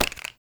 Acorn Pickup; Objects

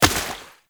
Step Water

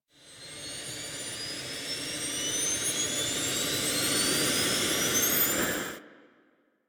Arch Wing Movement Turn Transition, Vehicles; Warframe

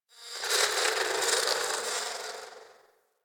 Garden Fly; Warframe; Ambience; Clan Dojo; Dojo Garden Animals

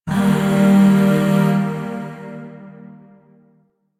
Zariman Void Shawzin Min Chord

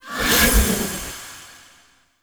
Ward Cast Healing Totem Accent; Powers; Fey; Founders

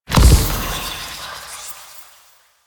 Shadow Smoke Teleport; Warframe; Duviri

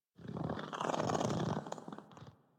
Pet Purr; Enemies; Warframe; Catbrow; PetShip

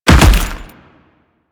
Land Heavy Dirt, Footsteps; Enemies; Warframe; Teshin; DE

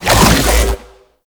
Infested Shotgun Reload; Weapons

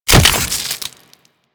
Cluster Explosion; Uzi; Warframe; Infested

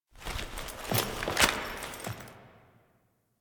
Fixing Computer Interact; Entrati Labs; Loid

